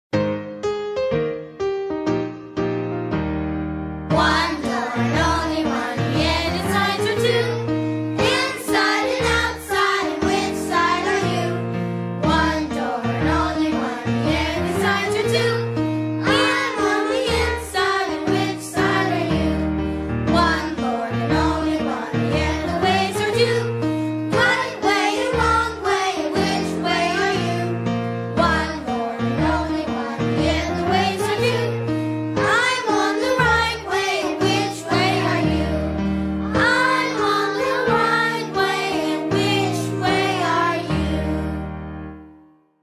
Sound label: Singing Unto Zion One Door & Only One